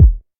bassy kick single hit f key; kick drum samples

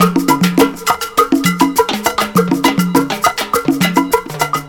68 bpm drum groove f key

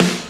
short steel snare drum f sharp key; Mono

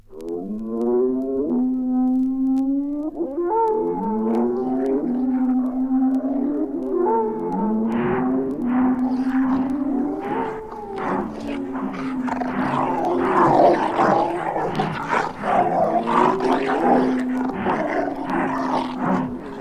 wolves howling and snarling

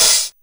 soft sounding open high hat sound f key

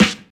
rich lows snare one shot g sharp key; snare drum

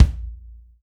mid range bass drum single hit c key

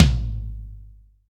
long tom one shot g sharp

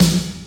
snare single hit f key; snare drum samples; single hits one shots

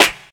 original steel snare drum sound e key

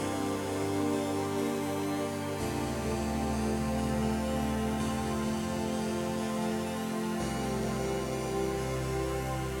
synth texture arp granular 100 bpm; synth loops

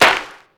clap single shot c sharp key; single hits one shots

